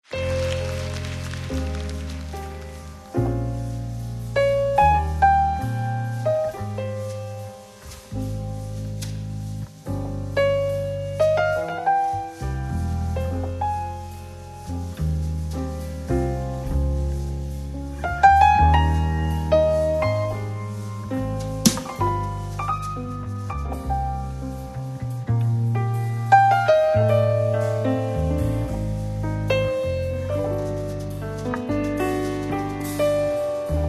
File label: piano live